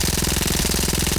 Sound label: Uzi